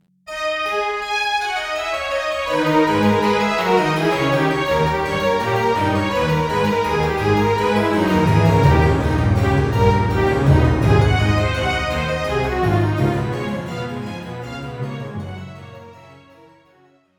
Baroque

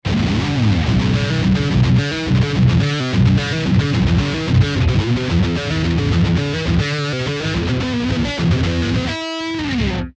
dirty

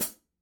hi-hat chick

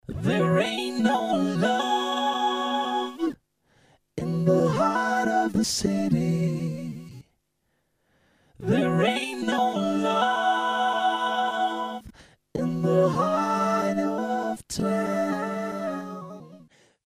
Vocoder Synth

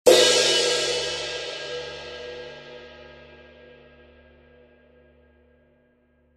crash

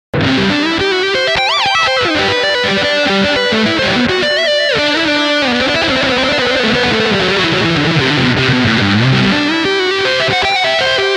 Lead; VOX

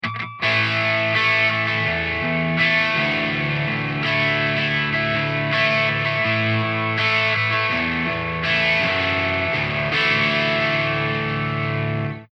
Semi Dirty; REV100HD; Chuck Alkazian

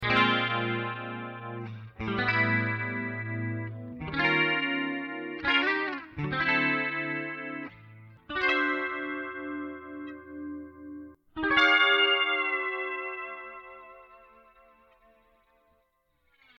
chorus

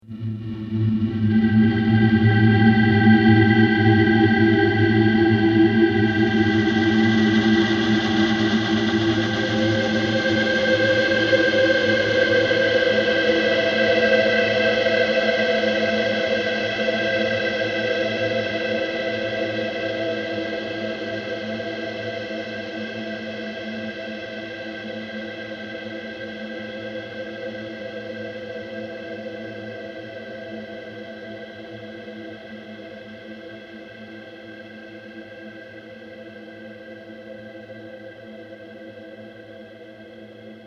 ambi clouds

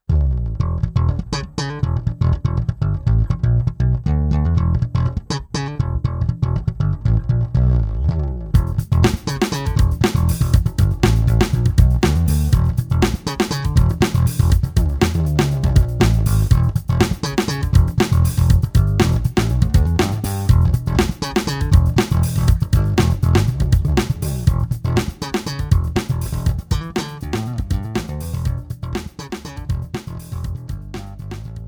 Slap

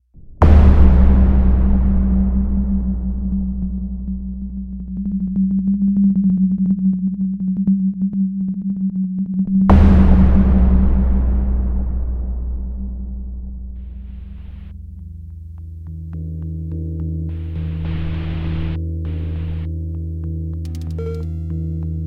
Moods; NORDRUM

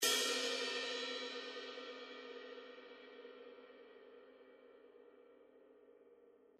Bow

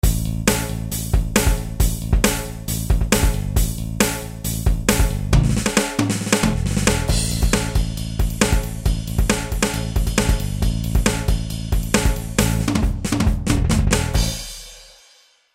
Hard Rock; BOSDR670

